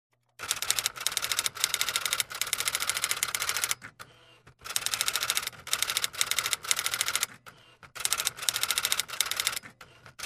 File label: Typewriter Clicks sound like